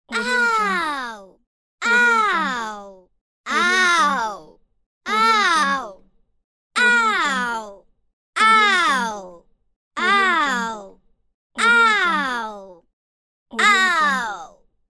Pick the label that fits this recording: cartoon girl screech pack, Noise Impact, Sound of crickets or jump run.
cartoon girl screech pack